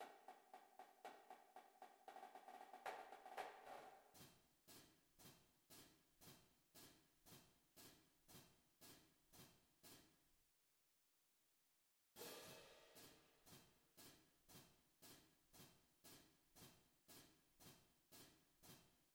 cadence cymbals

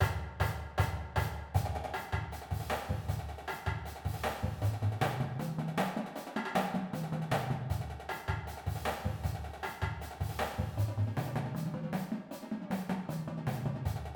full drumline

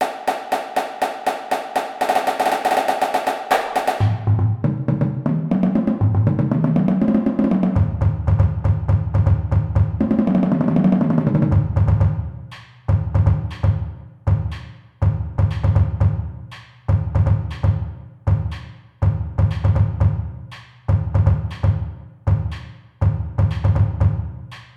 bass; cadence

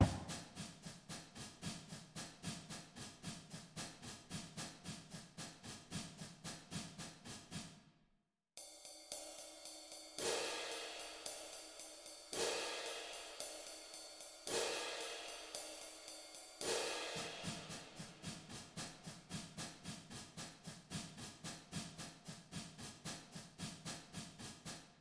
cymbals, drumline

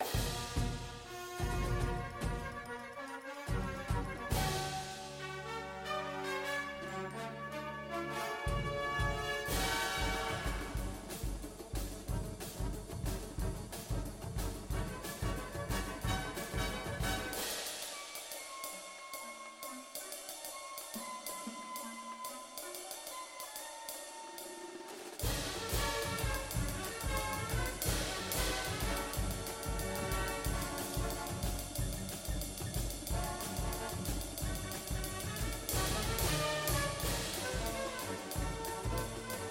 cymbals with music

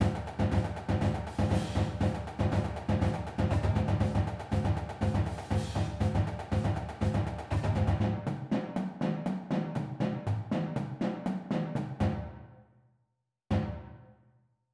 feet work, drumline

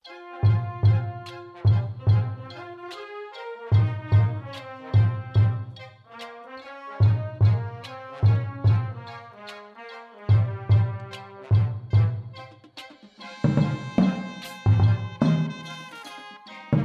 bass with music, drumline

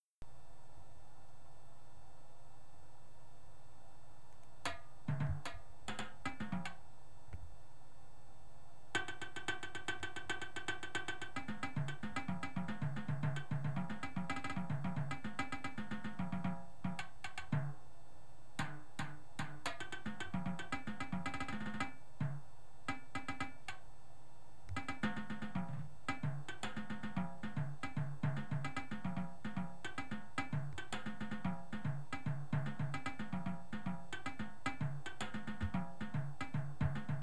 tenors

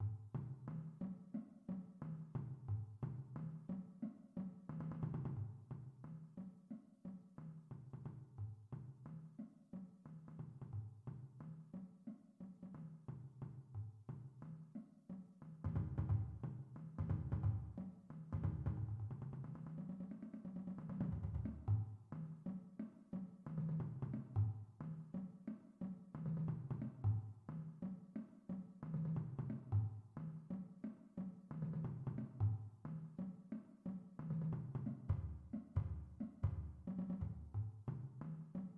basses, drumline

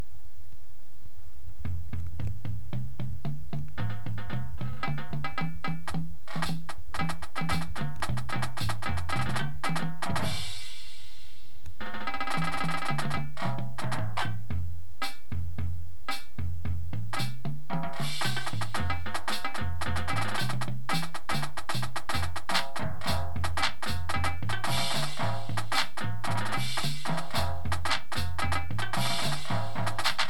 full drumline

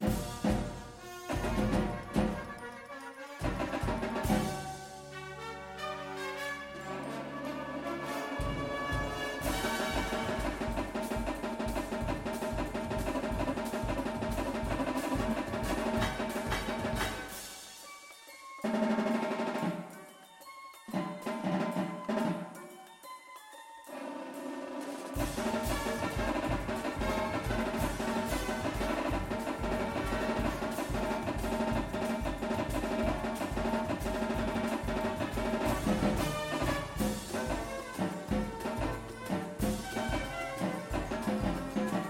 tenors with music, drumline

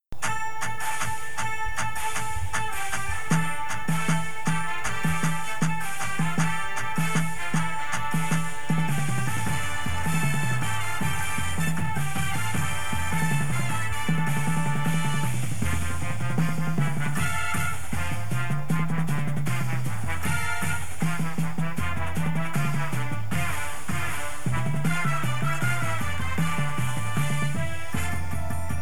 bass w music, drumline

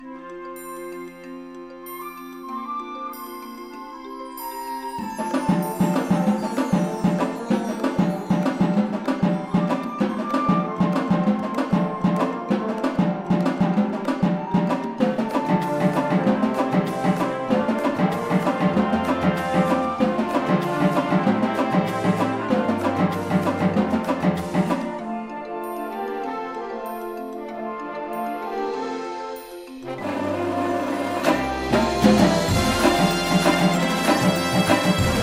tenors with music, drumline

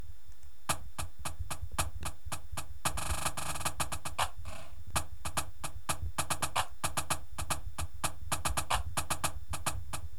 snare, drumline

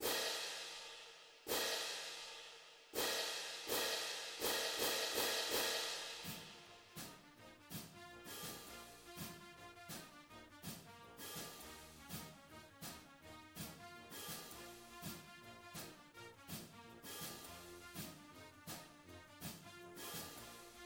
cymbals, drumline

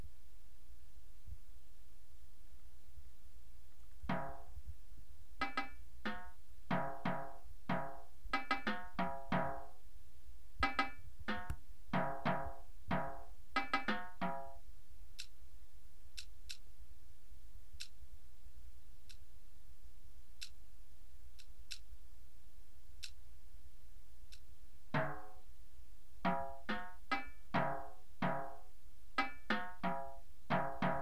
tenors, drumline

